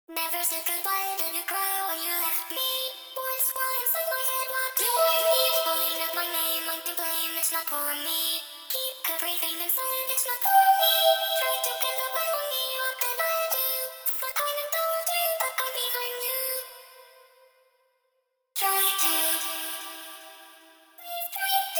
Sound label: harmony vox